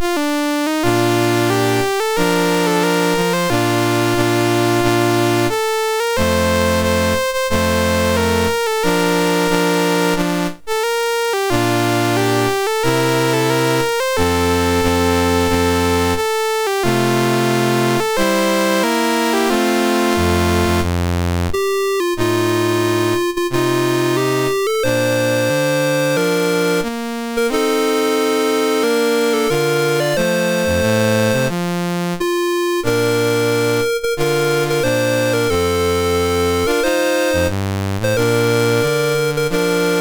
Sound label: Belgium, National Anthems, MOS6581R3